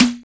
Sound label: BOX; Optical Media International; Sonic Images Library; SI FX Vol 1